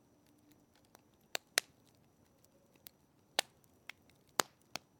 bonfire; OpenValley

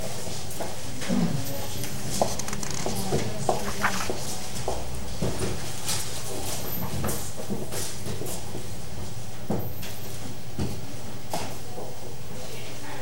public library; OpenValley

